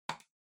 PC-Mouse (Far-C-Hard)

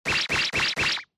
Motion Agility Phrase

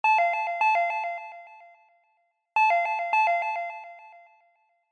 Doorbell Melody; ドアベル メロディー

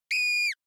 Horror Scream High Dry

